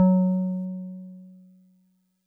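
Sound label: DigiPianet DX100 F#4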